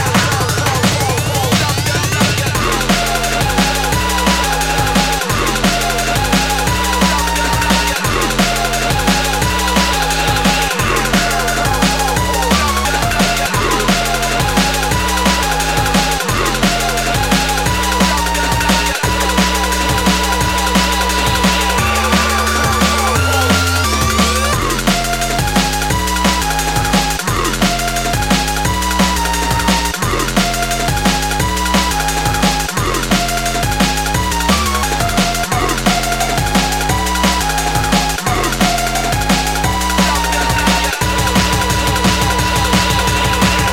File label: DNB Vinyl